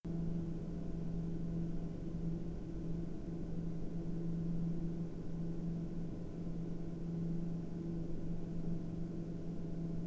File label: Max RPM